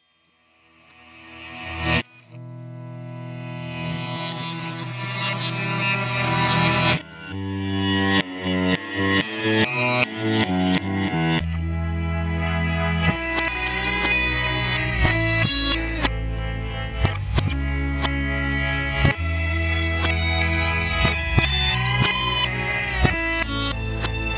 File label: Dazed Reverse